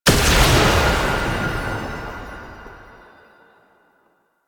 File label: Gunbrella Alt Shot, Weapons; Warframe; Tenno; Koumei